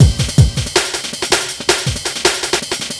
Beat, Drum N Bass

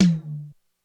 Hi Tom, Korg DSS-1 Drums

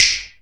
SNAP, FINGER POPS; AKAI S-Series CD-ROM Sound Library VOL-5

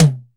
TEK TOM LO, TECHNO; Drums In Your FACE!

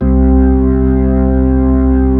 B-3, Full Xfade

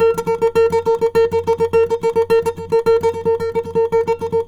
NYL TR; Spectrasonics; Hans Zimmer Guitars VOL-2; FLAMENCO FX

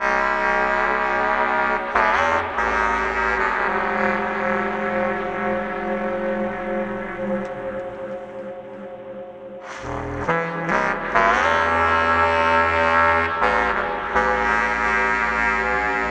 Communication E; Zero-G - Ghost In The Machine

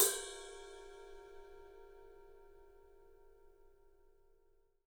Studio Ride; E-MU Producer Series Vol. 5 – 3-D Audio Collection; 3D Percussives

